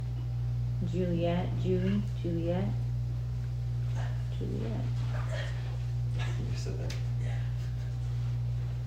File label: library cry; everyones entitled to one good scare